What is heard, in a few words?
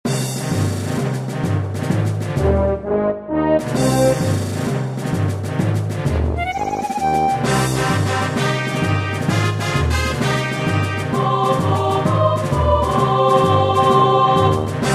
Marching Tank Choir Battle